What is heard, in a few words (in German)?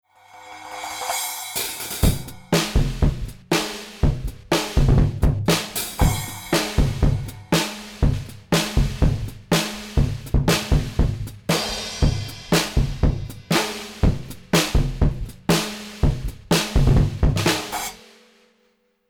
Mapex Drumkit Mix Saturated Mono Niere Einzelspuren Summiert Dry; Lewitt LCT 1040